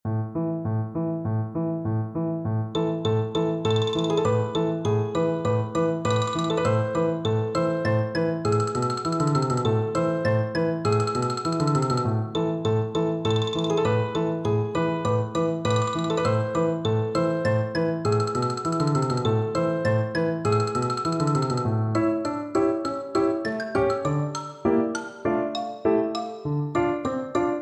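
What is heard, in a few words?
danses des cygnets, xylophone; percussion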